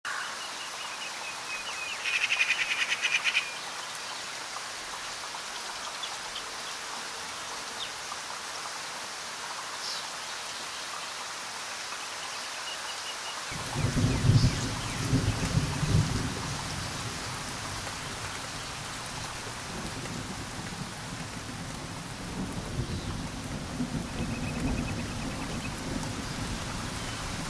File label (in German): hoerprobe voegel